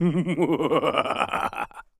Sinister male laughs. Human, Evil Laugh Spooky, Sinister Laugh, Male